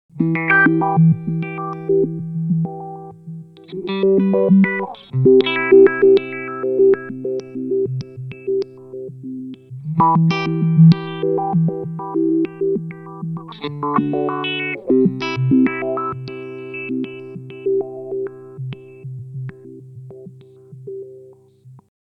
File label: Spatial Delivery V3 Sample and Hold; Earthquaker Devices